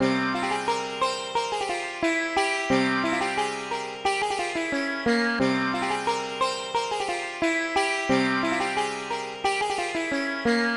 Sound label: Sitar, 089 Cmin; Hip Hop; Hip Hop Exotica; Apple Loops; Big Fish Audio